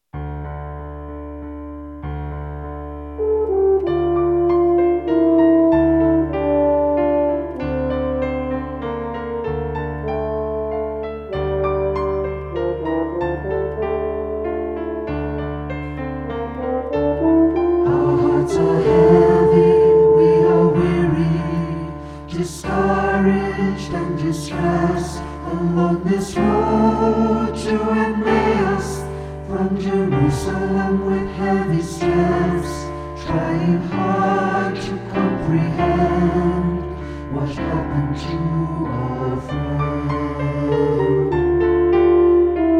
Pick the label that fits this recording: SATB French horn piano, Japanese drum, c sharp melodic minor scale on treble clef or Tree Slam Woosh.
SATB French horn piano